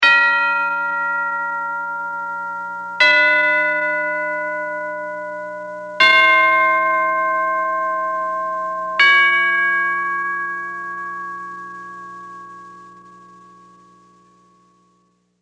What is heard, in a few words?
Tubular Bell Ascending; Bell Sound Effects; Bells (Sound Effects:Musical)